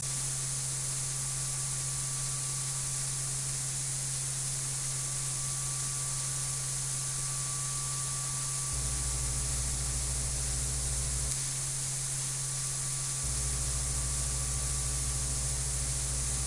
high Hiss

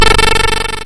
bird call

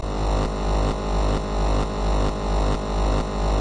swell

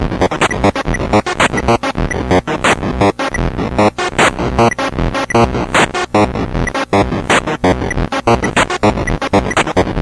slow Fast

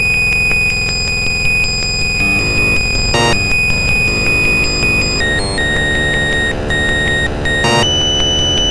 noisy

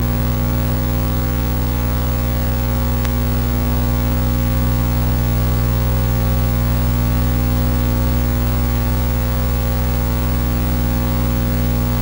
hum

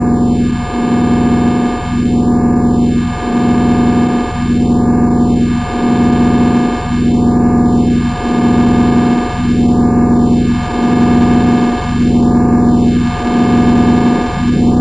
buzz

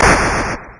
chomp

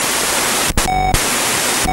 static

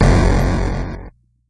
drop

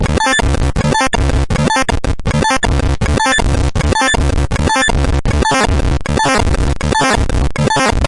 blippin

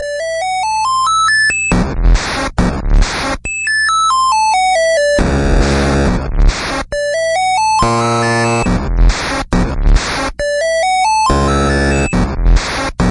bleepy drop

